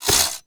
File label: drone strafe